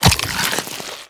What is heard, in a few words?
bite pull, barnacle creatures; Half Life Alyx